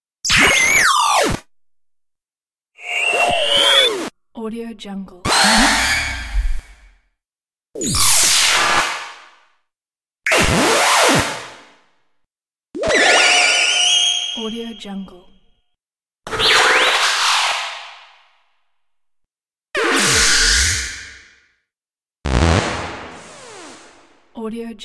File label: short radio imaging fx